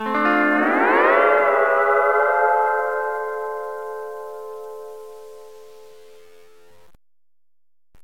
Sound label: sms hawaiian